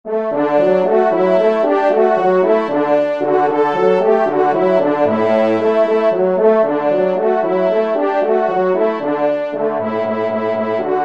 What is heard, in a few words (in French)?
TRP